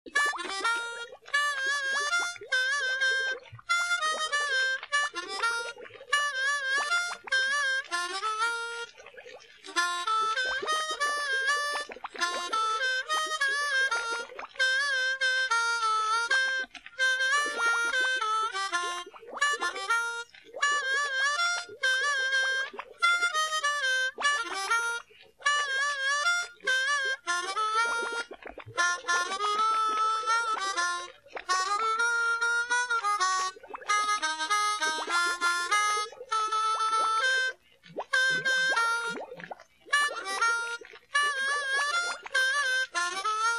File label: Harmonica Water